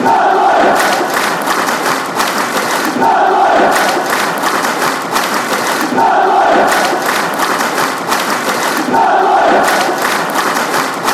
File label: clap, fanchants; blades